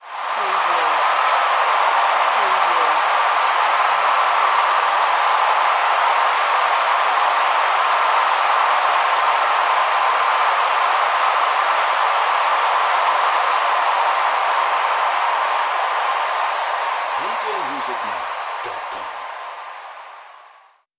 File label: Sports Stadium Crowd Cheer Touchdown Or Goal Scored